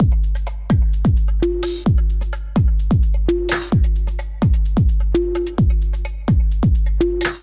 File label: Kit Melodic 129 bpm, Drums; MIDI Clips; Hyperion Kit; Ableton; Factory Packs; Skitter and Step